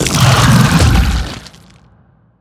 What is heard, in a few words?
worm death, creatures; Factorio